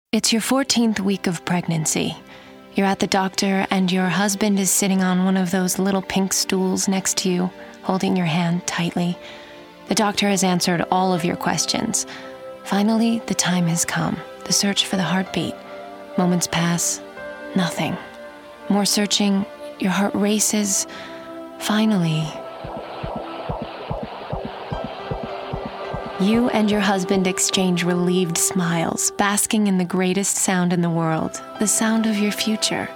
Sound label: heartbeat, radio commercials